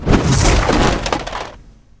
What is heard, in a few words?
Summon guns; magic